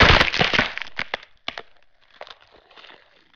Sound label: Spikes Wood Emerge; SFX Kit; Foley - Misc